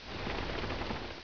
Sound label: DEBRIS; homm2